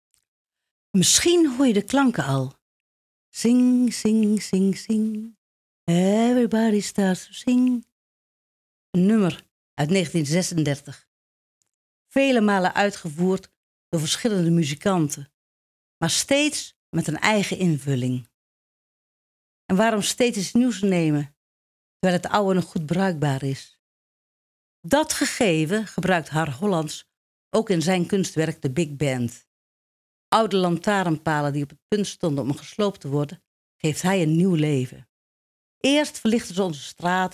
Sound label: big band